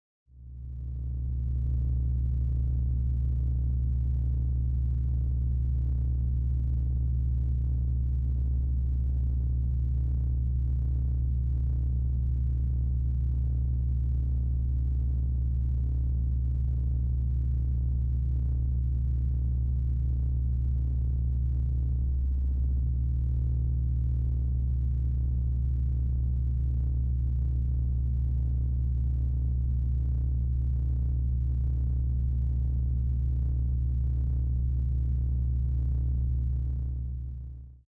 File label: lullaby